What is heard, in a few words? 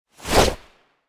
Dragon tail swipe